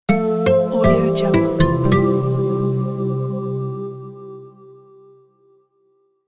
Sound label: acoustic guitar logo